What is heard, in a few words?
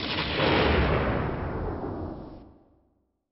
thunder